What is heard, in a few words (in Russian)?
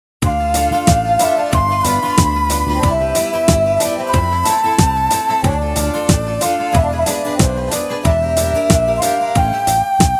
Мелодия; MUZYKALNYEIGRY